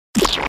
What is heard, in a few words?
cartoon Squirt